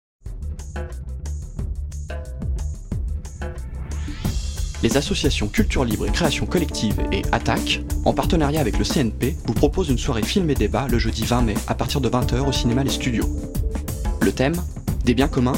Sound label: jingle